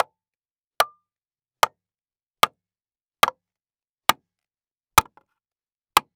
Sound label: Industry Tool Hammer Nail Into Wood